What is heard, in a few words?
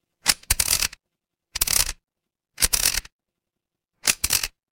mechanical gear